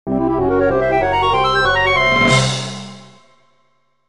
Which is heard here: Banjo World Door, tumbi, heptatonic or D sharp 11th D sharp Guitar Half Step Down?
Banjo World Door